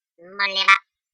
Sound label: Bop Robot High Pitched